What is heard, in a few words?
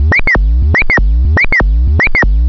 ship sensor